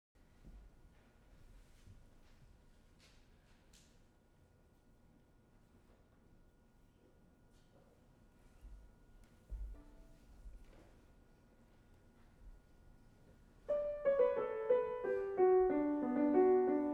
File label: Sonate pour Violon et Piano, G major Allegretto